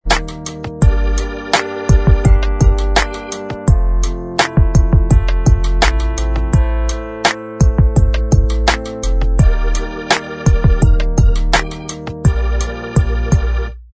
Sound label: Inst